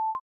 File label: notification